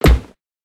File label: hurt